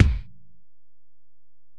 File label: Ac BD, Alesis DM-PRO; 300 Drum Machines